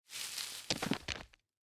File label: tree leaves; particles